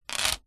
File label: foley metal key chain small set down plastic surface